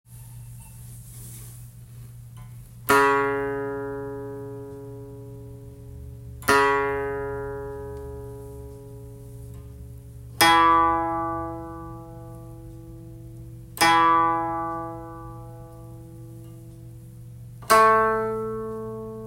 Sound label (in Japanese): 箏 調弦; C# E A# B C# E F# A B C# E F# A